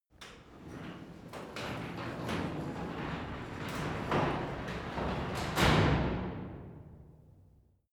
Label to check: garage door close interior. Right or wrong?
Right